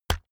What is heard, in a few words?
keyboard external key hit push